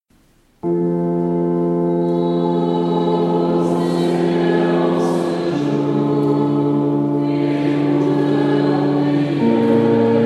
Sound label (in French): Tutti; O Seigneur